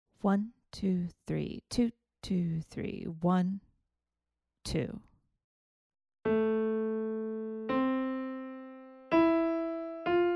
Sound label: melodic dictation